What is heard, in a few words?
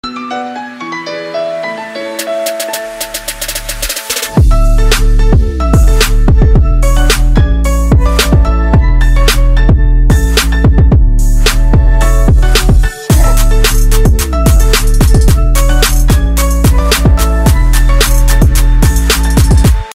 nokia 7 plus ringtone